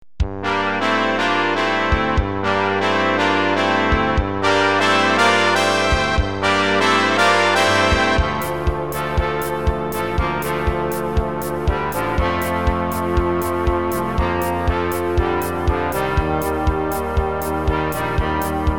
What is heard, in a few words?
Disco medley